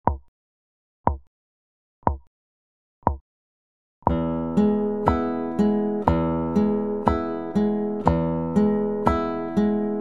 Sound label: picking